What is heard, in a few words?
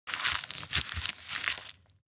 Paper trash rustling garbage; household